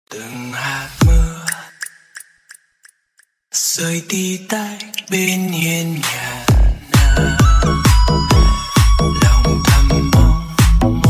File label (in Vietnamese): nhac chuong remix